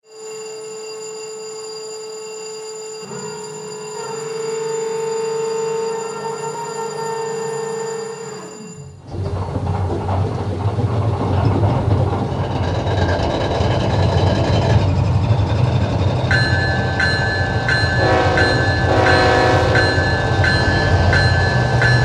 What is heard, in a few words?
GE Gevo-12 Tier 4; GE Gevo-12 Tier 4 ULT